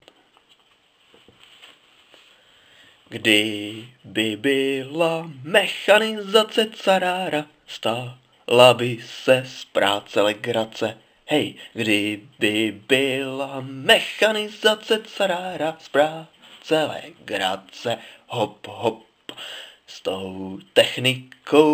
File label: polka